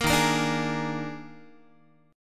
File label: D sharp Major 7th Suspended 4th Guitar; D#M7sus4; strummed; 13,11,13,12,12,x; 4A,4E,5A,5D,5Gsharp,6Csharp; chord shape